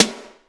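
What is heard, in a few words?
sizzly snare sample a key, tuned to the A note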